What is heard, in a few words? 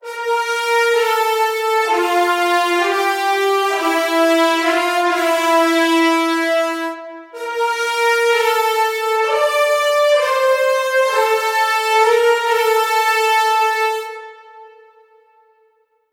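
Horns - D minor - 130Bpm; Construction Kits; STEMS; Ghosthack; SkyRealm; Kit 24 - Dragon Mountain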